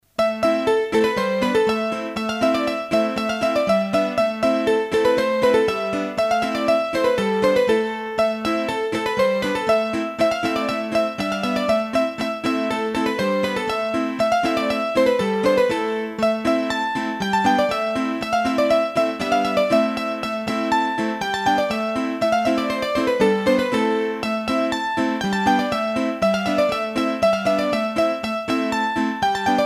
Polka, hurdy gurdy

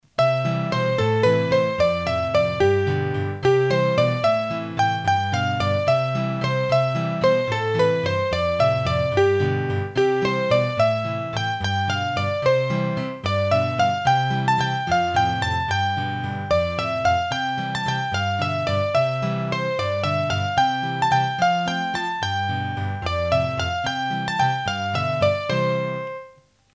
Valse, hurdy-gurdy; Massif Central

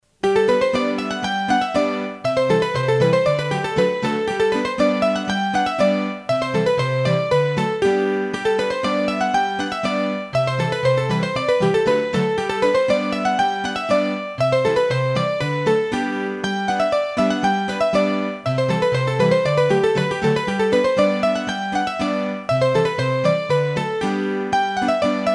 Polka, hurdy gurdy; Polka de Chateau Chervix

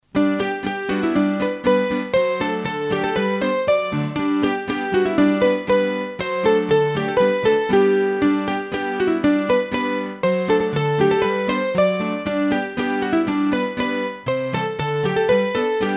Polka, hurdy gurdy

